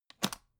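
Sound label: aluminium case small open short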